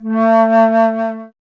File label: Alto Flute